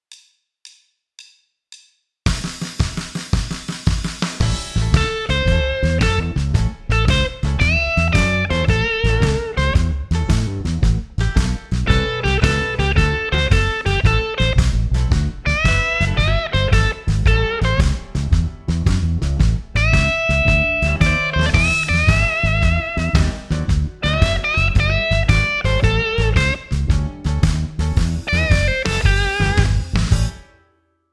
Shuffle A 4 Note Solo

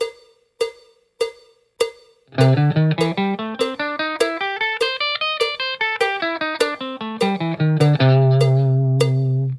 triplet, blues guitar; Ex 19-1 triplet feel; Blues Box 2

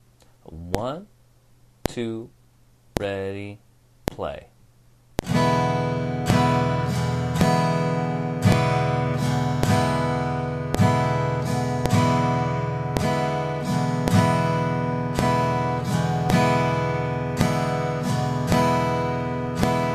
simple strumming

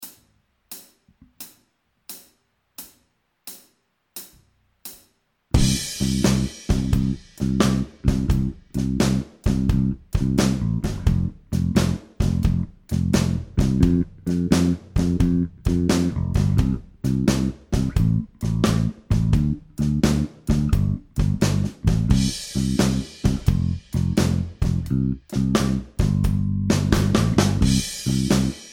no guitar, blues

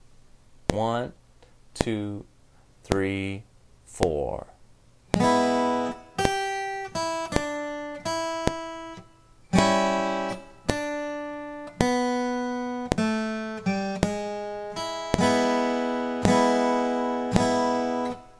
sitting easy blues play along

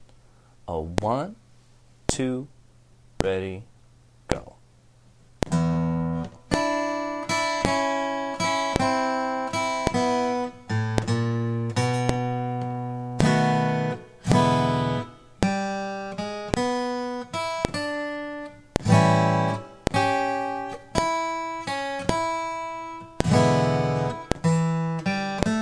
full out blues play along

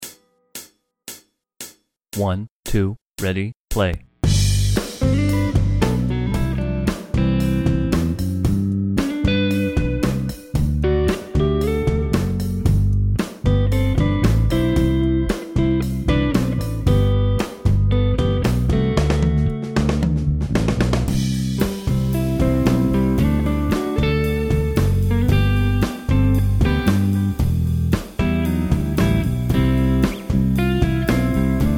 full band rhythm only

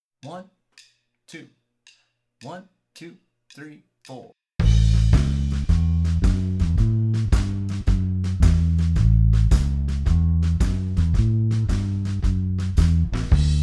3 Chorus Shuffle In A

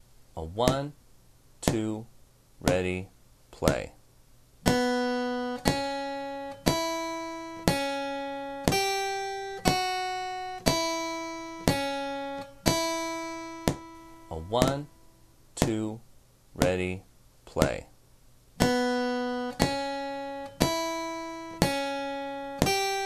first licks, blues guitar

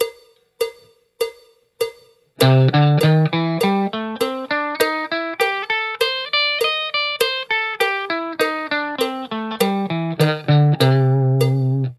straight, blues box 2, guitar; Ex 19-1 straight feel

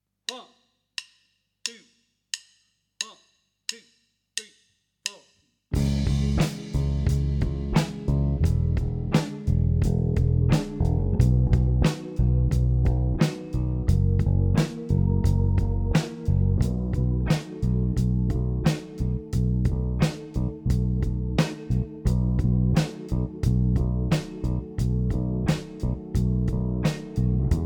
Straight 88bpm in B No Guitar; the pentatonc 6 sound

